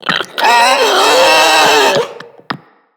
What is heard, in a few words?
Infested Ancient Charge; Enemies; Puss Ancient